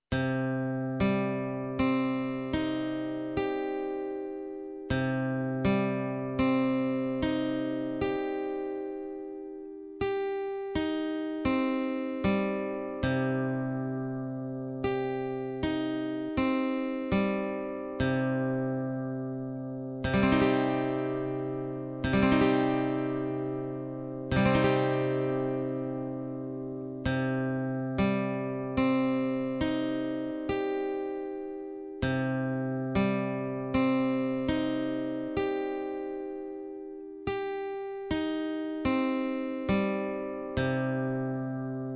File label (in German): C Moll Gitarrenakkord